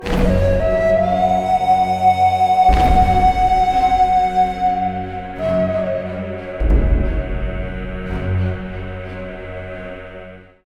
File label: Stinger See Indians Long